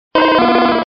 Grito; Charmander

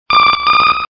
Grito de Sunflora

